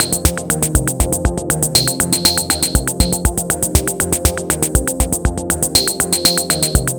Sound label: Drum and Bass Groove with Effects